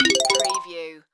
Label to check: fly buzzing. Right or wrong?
Wrong